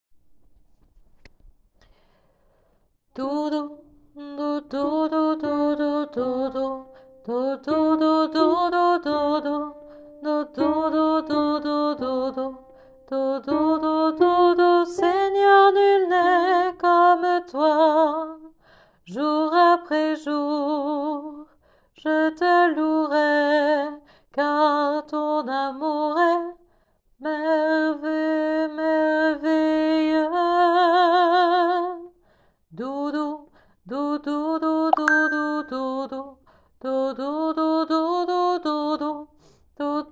Oh Jesus mon sauveur alti

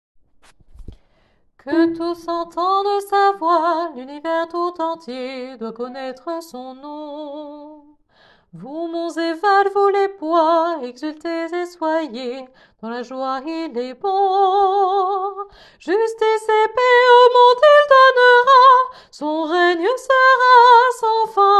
Que tous entendent sa voix sopranes

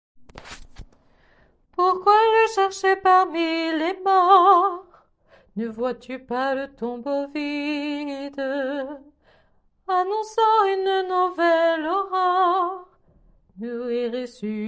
Il est ressuscite alti

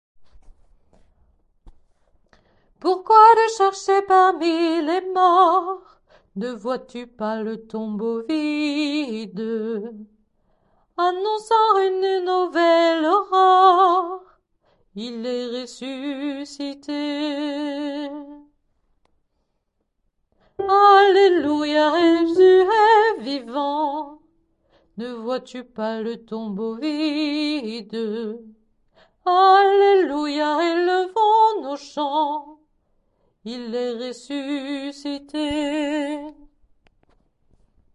Il est ressuscite basses

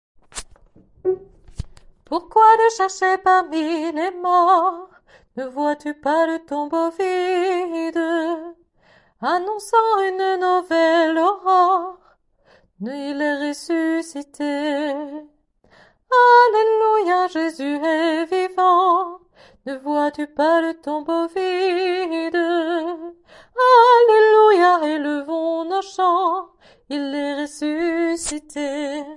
Il est ressuscite sopranes

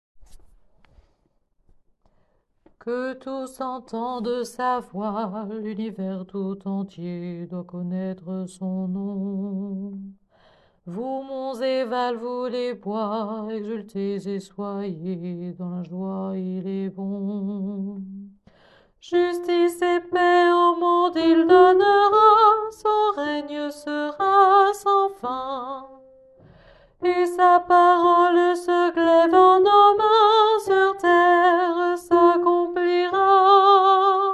Que tous entendent sa voix basses

